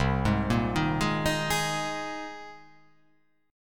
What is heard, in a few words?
C Minor 7th Sharp 5th